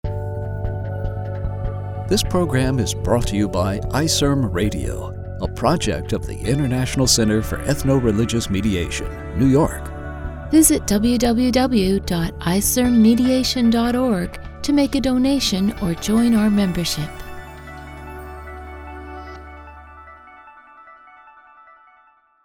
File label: Radio Bumper; ICERM